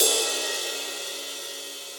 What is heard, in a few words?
Ride DeepSnareDynamics; Drum Hits; Ableton; Factory Packs; Session Drums Club; MPE Hits